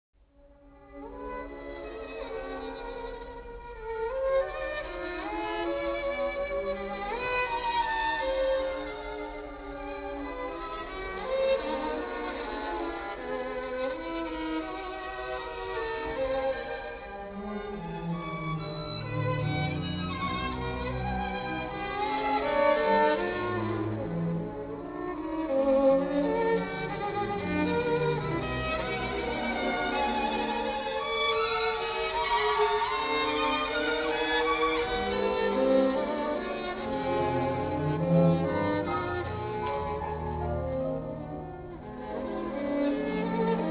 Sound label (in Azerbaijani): skripka; qarayev